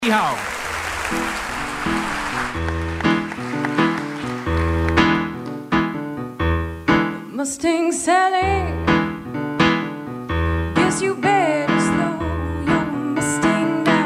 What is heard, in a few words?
song